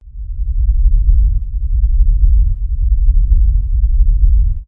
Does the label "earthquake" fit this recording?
Yes